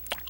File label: dripp; dungeonmaster